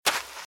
Footsteps Sand Dry Run Right; RadwinIsland

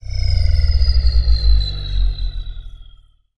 Space Age Motor Cycle, Sci Fi; 1001 Sound Effects

